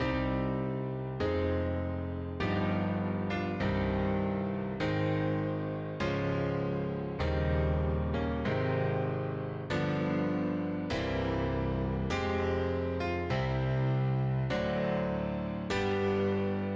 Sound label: Prelude; Chopin